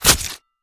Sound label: Spear Scale, Damage